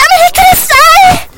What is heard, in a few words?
click